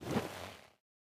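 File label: movement armor; smite; pele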